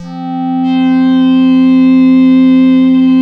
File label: MOURNFUL C3, OBI PADS; Zero-G Ambient 1